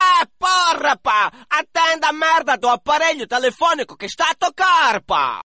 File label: african phone beautifull